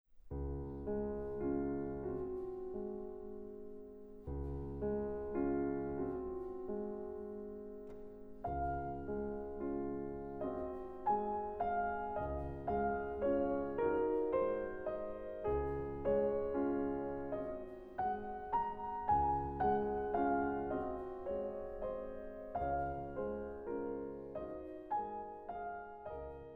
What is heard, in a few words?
Berceuse in D flat major; chopin